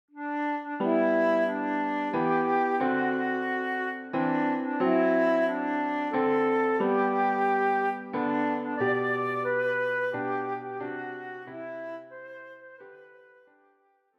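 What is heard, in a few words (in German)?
Querflöte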